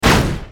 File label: magic fireball